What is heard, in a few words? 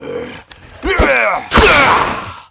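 Fire Lich Attack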